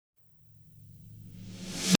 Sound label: rev snare